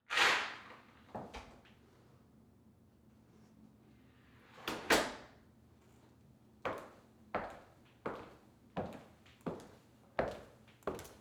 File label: Foley Footstep Hard Sole Open Door Walk Pull Out Chair Sit; Foley Footsteps